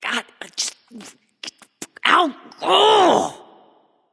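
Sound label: grumble, female; smite; voicepack; hera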